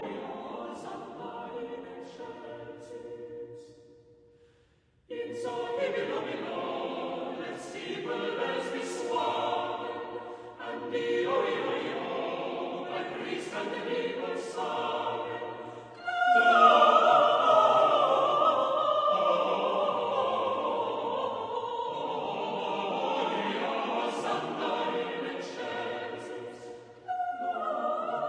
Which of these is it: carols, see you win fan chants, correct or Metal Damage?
carols